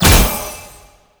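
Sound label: Kunai Projectile Explosion, Weapons; Warframe; Choir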